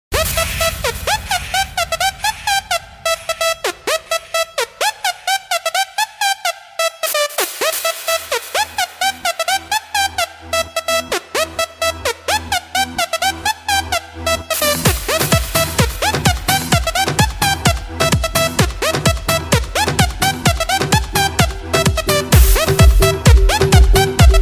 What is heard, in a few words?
El House Beat